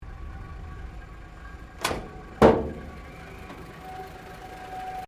CAR HOLDEN HD PREMIER IDLE OPEN BONNET, Classic Australian cars; 1965; Vehicles; SoundEffects-Foundation1